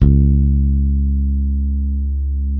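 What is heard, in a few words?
Sadowsky 5 bass, C#2; Sampleheads; Will Lee Bass Library; SADWSKY5 SUS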